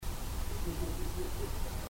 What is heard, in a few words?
laugh back closet amp